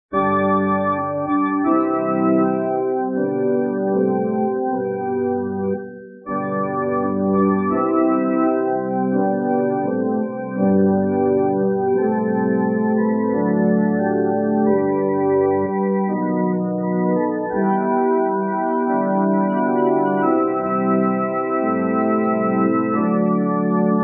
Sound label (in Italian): solo musica